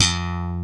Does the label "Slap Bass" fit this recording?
Yes